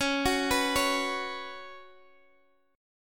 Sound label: C sharp 7th Suspended 4th C sharp, Guitar Drop D